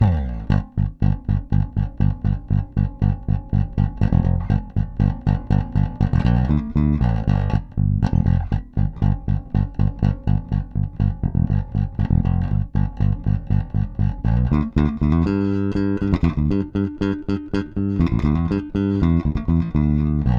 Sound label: jam bass; king koa; nordys